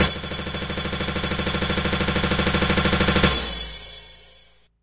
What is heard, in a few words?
Drumroll; magazine mogul; android; ps4